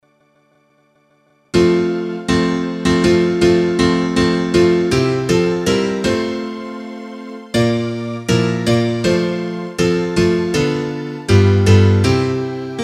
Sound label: instrumental